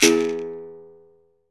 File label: Berimbau